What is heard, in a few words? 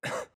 cough; CrossCode